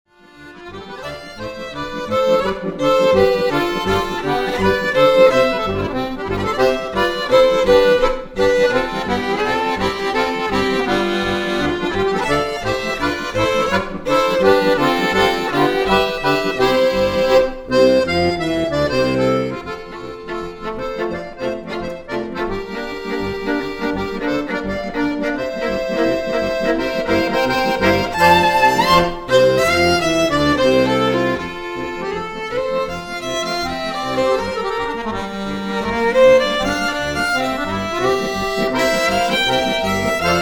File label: Polka